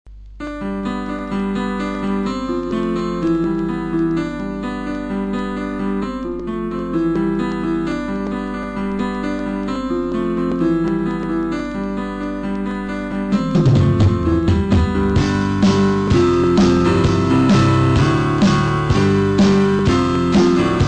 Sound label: Soft Hardcore